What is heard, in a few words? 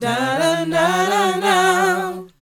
BOYZ C#, Vocal Planet R&B & Dance; ILIO